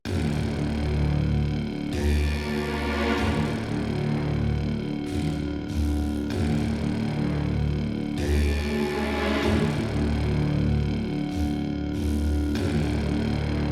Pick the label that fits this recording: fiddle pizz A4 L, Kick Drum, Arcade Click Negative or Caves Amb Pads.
Caves Amb Pads